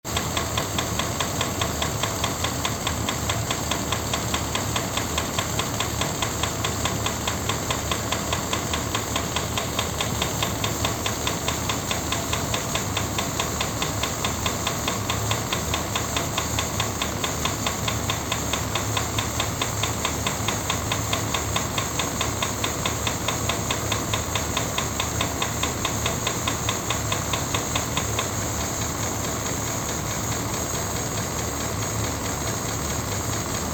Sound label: engine noise, auto